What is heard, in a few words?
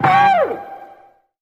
ooooooooh, Voice; Notes